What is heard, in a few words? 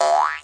vintage cartoon boink; Cartoon Sound Effects Kit